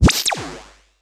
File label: Glitch; Cymatics; Light Free Sample Pack